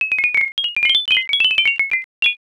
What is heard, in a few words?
pachinko